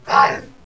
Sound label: pain